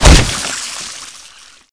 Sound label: Weapons Bio Rifle